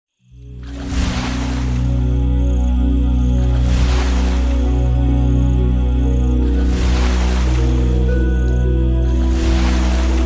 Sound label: WaterRower Driftwood Sound